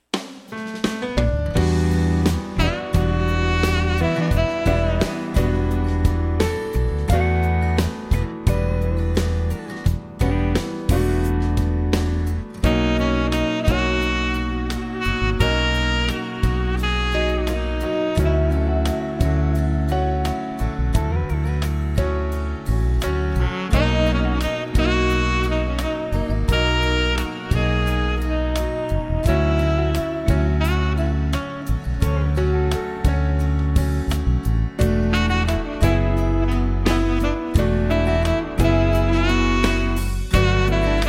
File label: Instrumental